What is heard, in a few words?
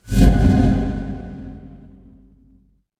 magic scifi spell